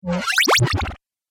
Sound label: Game Time Warp Fail, video game